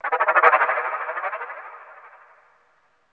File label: Shudder; BERZARRESOUNDZ